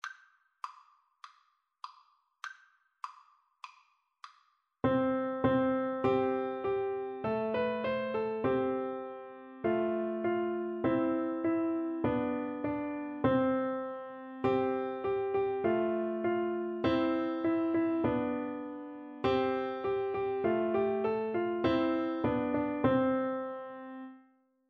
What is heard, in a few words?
Baa Baa Black Sheep, Piano Duet